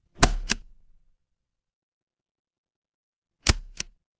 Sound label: office stapler